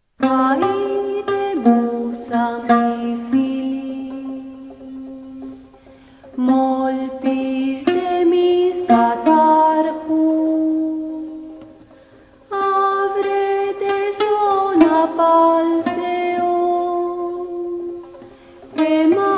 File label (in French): Hymne au soleil Antiquite grecque